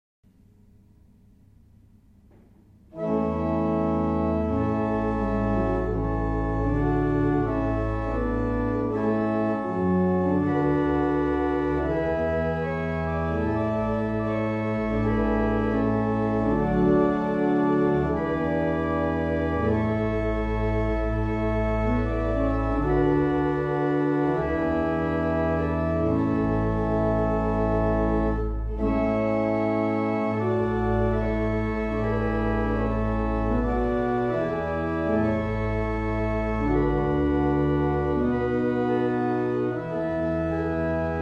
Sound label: Evening Hymn, barrel organs; Meldreth